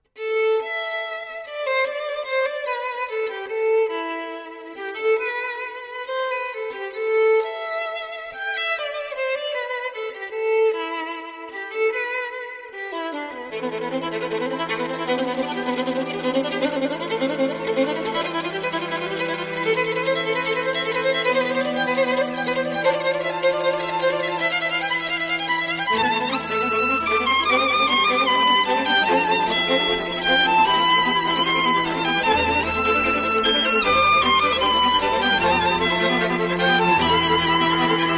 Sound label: violin concerto excerpt